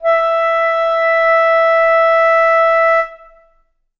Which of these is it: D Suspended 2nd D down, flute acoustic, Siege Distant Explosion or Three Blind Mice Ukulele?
flute acoustic